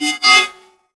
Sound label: a game sfx, interface